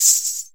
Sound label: percussion, Ethnic Perc